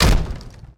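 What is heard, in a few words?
chain platform land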